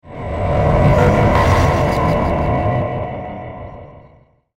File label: Welcome To The Mothership, spacecraft sound effect